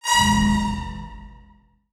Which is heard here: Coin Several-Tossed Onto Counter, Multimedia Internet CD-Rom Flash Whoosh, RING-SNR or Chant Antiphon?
Multimedia Internet CD-Rom Flash Whoosh